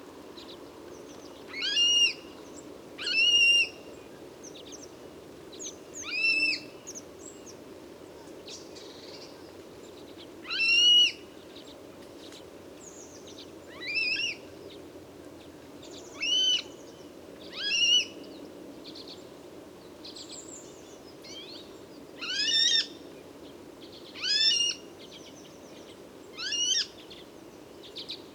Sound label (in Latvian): dumbrcālis rallus aquaticus; Water Rail (Rallus aquaticus); putni liepajas ezera zirgu sala